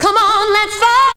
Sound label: VOC